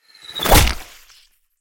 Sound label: piercing shot, ranger; A succubus is born